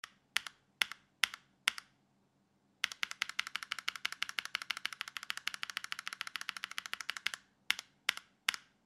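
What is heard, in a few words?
Front Crystal Sound, mechanical keyboard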